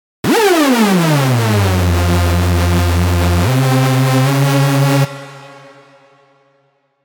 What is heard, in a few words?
Hard Techno Hoover Synths Loops F minor 150bpm